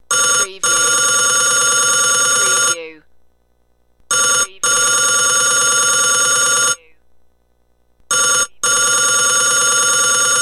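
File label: Telephone 4 Rings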